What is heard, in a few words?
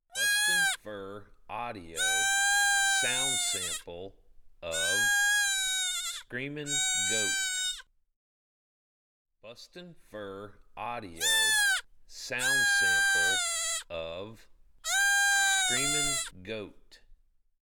Screamin Goat